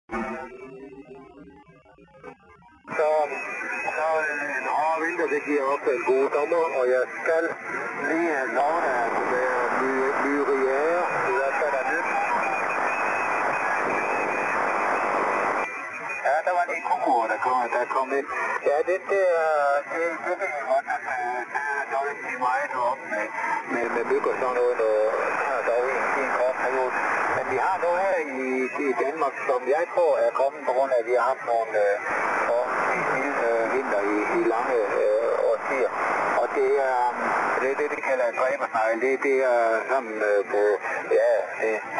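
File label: 80 circular polarization local QRM reduction; 80 meters circular polarization and local qrm reduction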